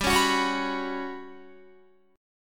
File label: G Major 7th Flat 5th, Guitar; GM7b5; strummed